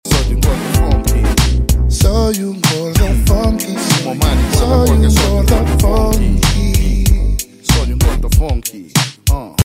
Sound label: funky